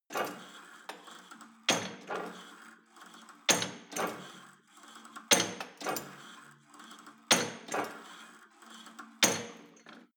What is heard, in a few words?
cable machine pull and drop full sequence, fitnessstudio